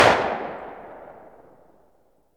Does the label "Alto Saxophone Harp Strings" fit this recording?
No